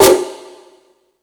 Percussion FX, E-MU Pro-Cussion

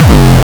909 Dist Reso Crushed, F1, Kicks; Mbase 11 Kicks

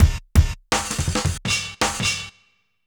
Chopper 165, Drum&Bass Breaks; EZ Rollers

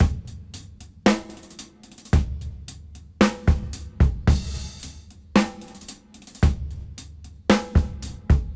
Half Time Loop 55 BPM; AMG - Keith LeBlanc Phat Butt Jams

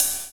Ride; Equipped Music; Loungin House

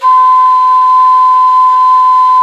QUE H 5B, quena; SoundBytes - Early Patches

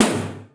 W TOM 01, drums; East West - Global Sounds

